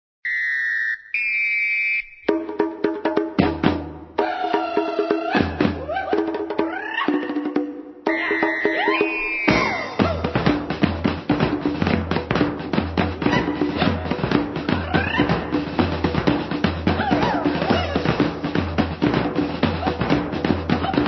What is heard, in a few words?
Samba